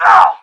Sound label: pain; action-quake2